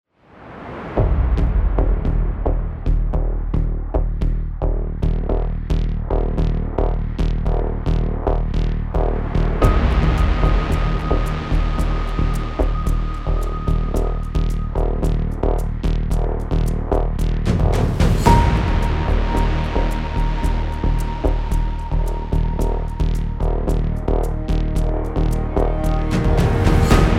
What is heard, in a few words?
Firestorm, epic music; epic music pack vol 1